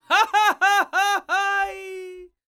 LAUGH, ANDES; World Voices; ANDES FX; Spectrasonics Vocal Planet

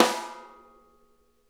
SNARE RIM; Sonic Reality; Interactive Drum Kits

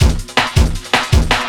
Drum & Bass, 160 BPM

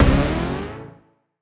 up A, Poly Chord Hits Ramp Up; future rave

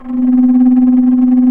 FLT, Bass Flute, Bs Flutter; Roland LCDP04 Orchestral Winds; 90 s Sample CDs

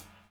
DOWN, DOWN KIT 78

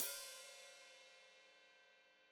Hat Foot Open, Hi-Hat; Deeply Sampled Drums

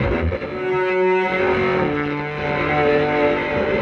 Fierce, Electric Guitar; Sonic Foundry (Sony Creative Software); Friendly Fire; Wrath

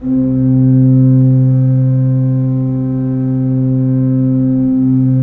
3D Organs Pipe Mix Celeste

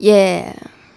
Female Vocal; Vocals; Sonic Foundry (Sony Creative Software); Mac Money

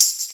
TAMB, Percussion; InVision Interactive; Percussion Slam

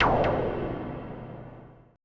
AMBI FX

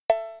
Drum Analog Kit Cowbell Analog, Yamaha RM1X

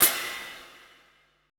E CRASH, ETH PERC

